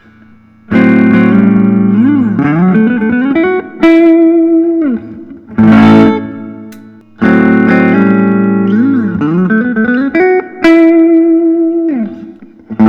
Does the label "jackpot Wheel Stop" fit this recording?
No